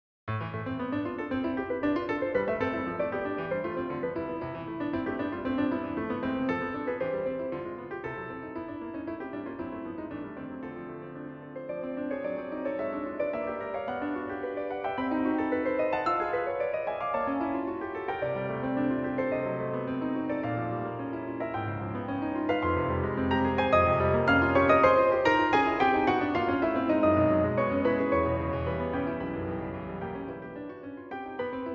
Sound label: Piano toccata; Delius